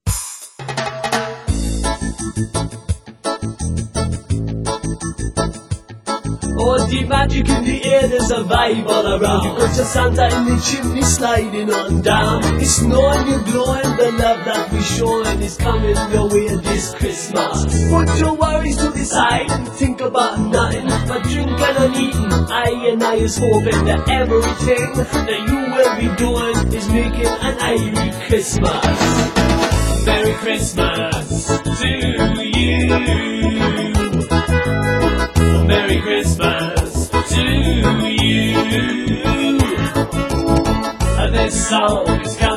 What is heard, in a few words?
xmas reggae, happy birthday to you